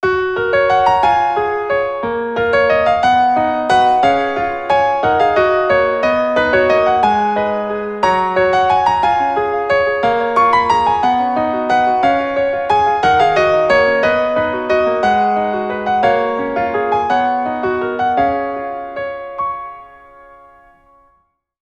Wonder Waltz; PlusFourSix; Lars Edvall

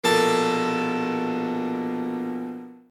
Harpsichord, phone; LG

